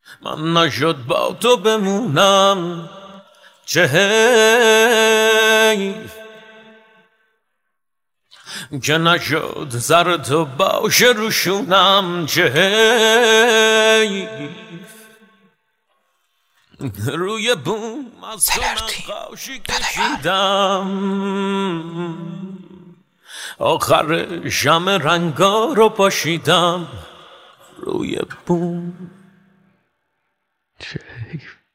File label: Vocals